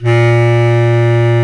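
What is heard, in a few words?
bass clarinet, Orchestral Winds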